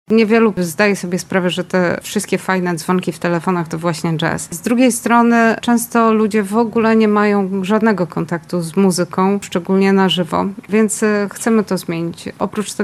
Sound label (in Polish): fortepian